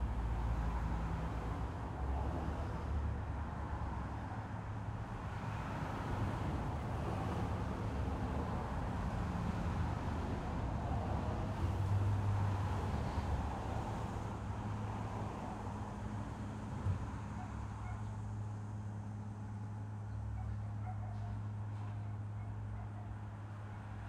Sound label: City, Buffalo, Residential, Traffic, General; UberDuo; The ‘hood Audio Playset